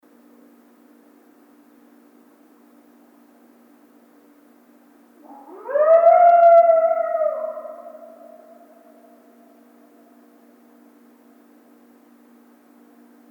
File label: Coyote Single Howl